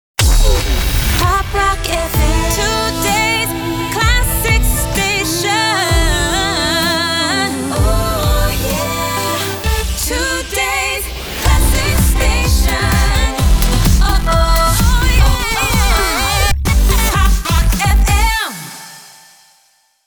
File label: Pop Rock FM Jingle Slogan